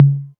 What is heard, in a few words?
808 Tom Mid, Drum Machines